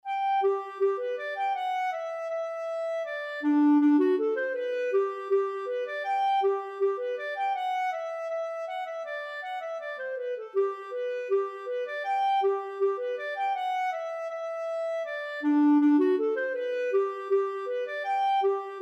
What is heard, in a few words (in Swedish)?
Luffarschottis